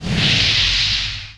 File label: frost hit; RYL